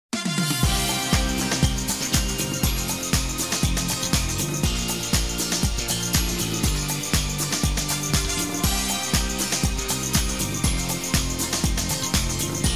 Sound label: samba